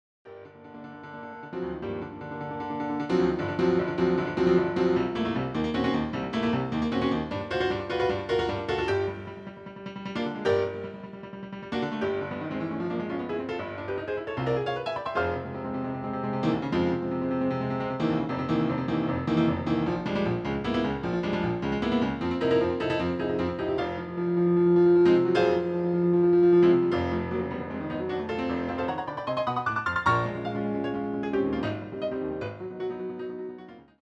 Piano Concerto 3rd Movement; Gershwin

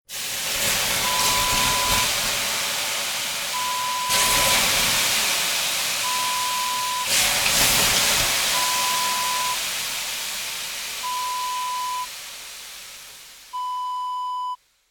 sauna

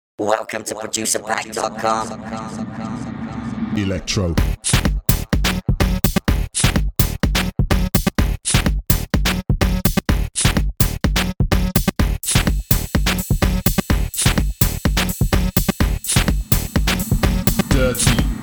dirty electro essentials

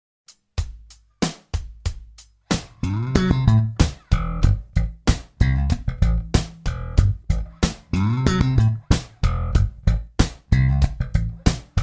Groovy slap bass in A

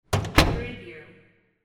door close

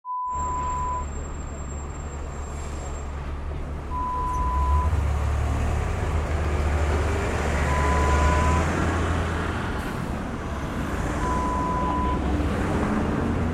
city street sound

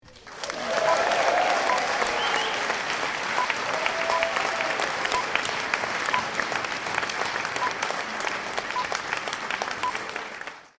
Applause

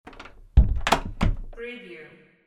door close

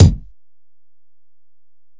kik

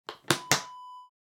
case latch close

